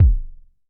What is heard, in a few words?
Kick Live Low